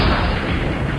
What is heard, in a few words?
crash